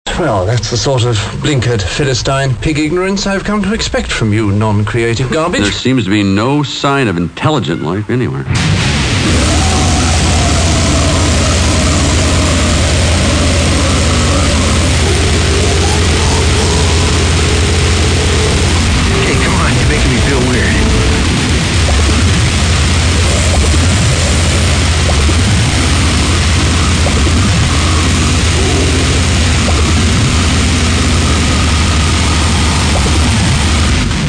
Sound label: drum machine gun